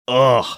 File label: ugh